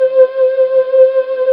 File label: SYN K-2000, Roland